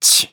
tch

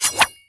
katana roll

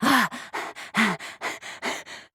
drooling

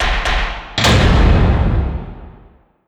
class trial start; DRRetribution

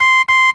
2 beep; matilda

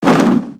transform; aj olga

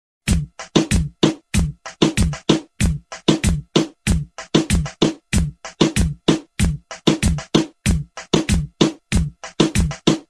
laughing bubbling baby remix

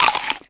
dual eat; tin pillar

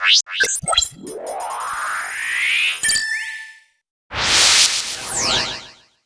startup

